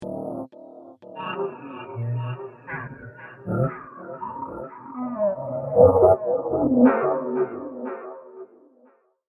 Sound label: Lair Of The Lunar Monster, monster sound effect; Monster Sound Effects; science fiction sound effect